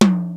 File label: drum; Rubber Chicken Software Sample Bank; DRUMS + PERC; EMU